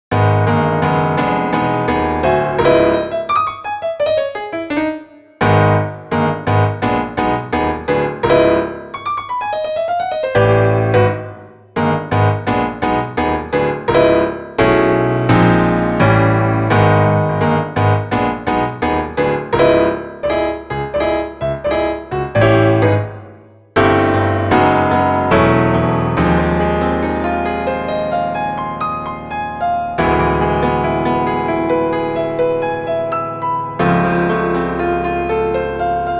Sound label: piano